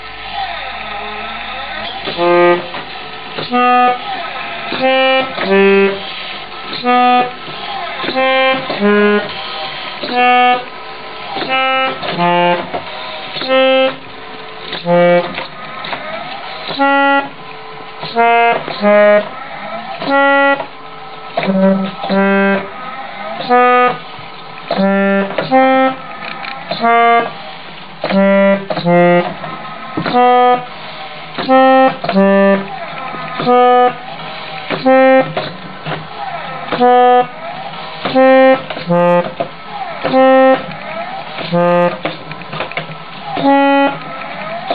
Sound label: robo bass